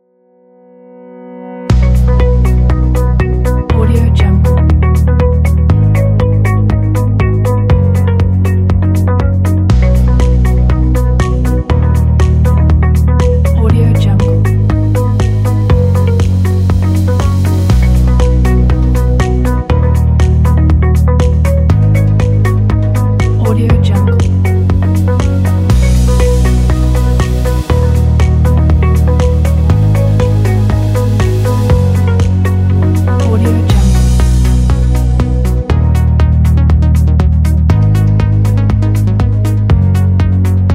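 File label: medical commercial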